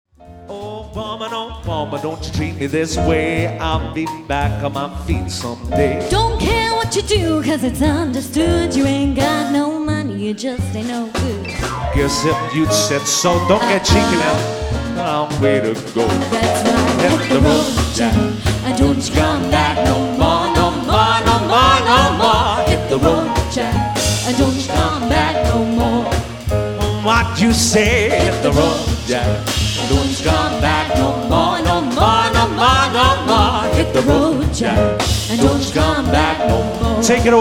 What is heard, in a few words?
Swing Band, Live